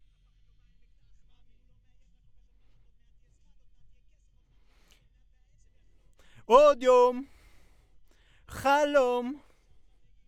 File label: B. Vox